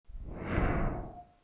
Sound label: Wind Whirl Small Air Blow